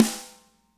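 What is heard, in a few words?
snare right; Spectrasonics; Burning Grooves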